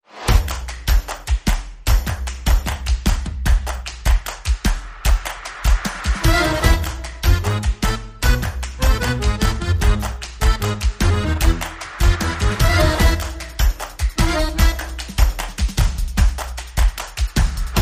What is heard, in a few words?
No Fiddle